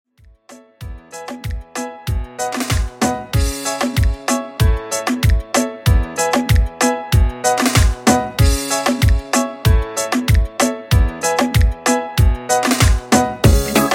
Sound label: Latin Urbano Reggae 95Bpm